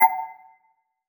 UI CountDown

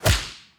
Mage Attack hit

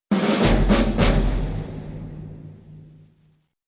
super push

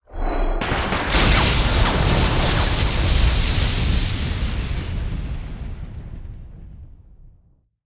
Mage Skill

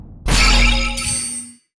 Open Map

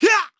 Role Fighting Shout

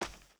Walk

